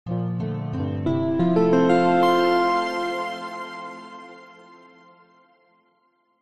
musical ident signature soft calm warm sincere bright